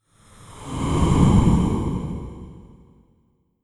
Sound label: Dark Ghostly Ambient Voice